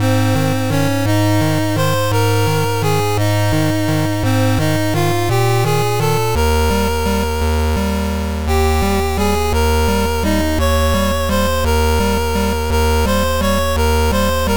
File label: 8bit